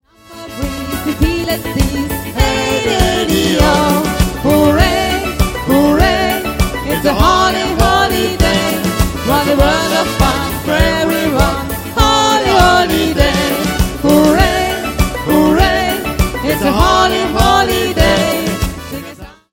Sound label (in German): Duett